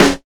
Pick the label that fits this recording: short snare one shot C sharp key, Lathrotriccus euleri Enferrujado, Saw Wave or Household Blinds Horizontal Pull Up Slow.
short snare one shot C sharp key